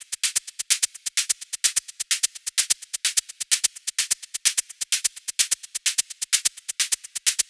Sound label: Drum Loop Top 128, Melodic Techno; Flow